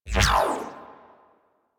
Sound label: Jukebox Exit; DE; Warframe